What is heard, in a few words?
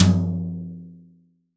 TOM, CTD TOM BRSH